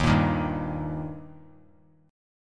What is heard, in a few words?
Eb Major Eb down Bass Standard; strummed; JGuitar